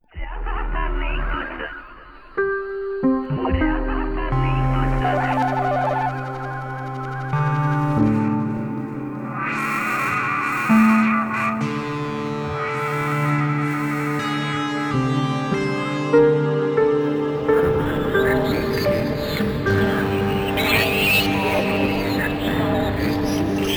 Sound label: Ambient; AirWaves